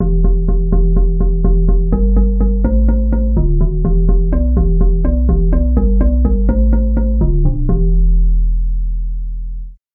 marimba bass

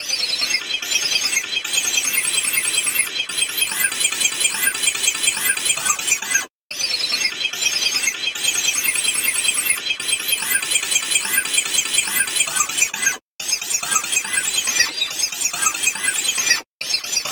fret noise

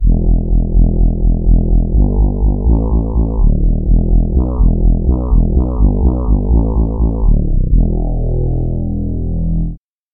chiffer lead bass

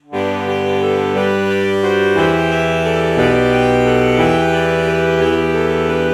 alto saxophone alto saxophone morph

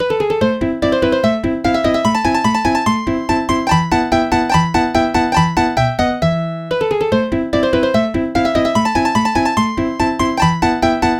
acoustic bass mozart samples

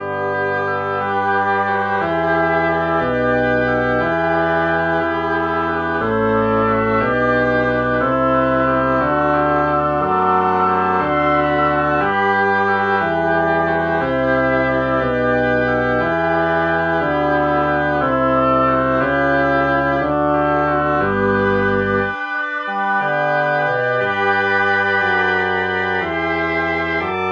oboe

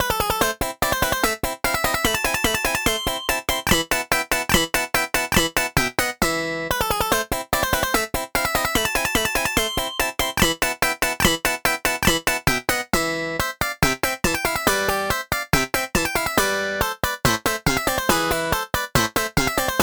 pop bass

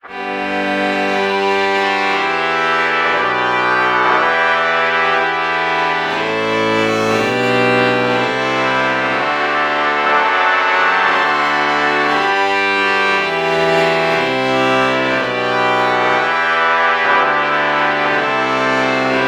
trumpet violin lpc false bach morph

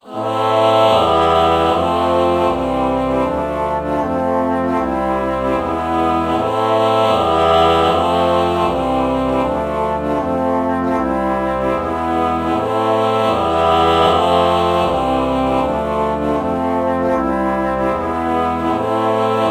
alto flute ah chord morph

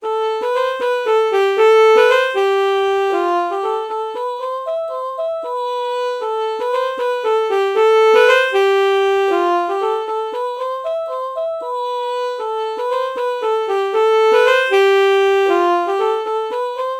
alto saxophone ah melody morph

